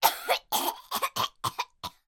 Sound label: human coughing child boy 5 years old